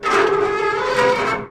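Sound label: safety gate open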